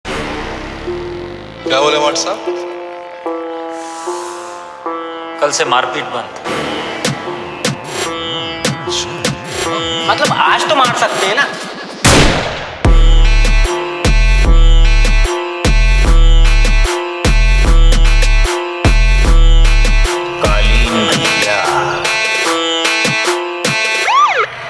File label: Dialogues Remix Trap Music; Mirzapur; Kaleen Bhaiya; Amazon Prime Series